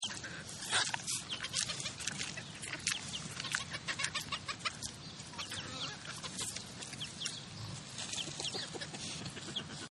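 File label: Pig Eating with Chickens; Animals; Partners In Rhyme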